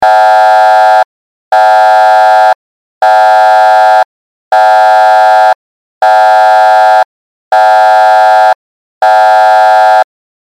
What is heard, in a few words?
sound earthquake maneuver